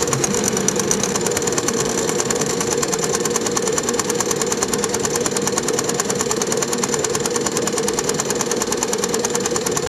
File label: mechanic sound